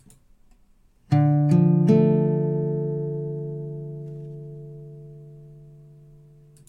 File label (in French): accord de RE mineur, guitare